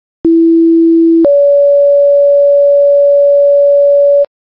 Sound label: page, tones